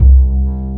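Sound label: Acoustic Bass Note; Mo' Phatt; Digital Sound Factory Mo' Phatt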